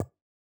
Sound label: click; xrfragment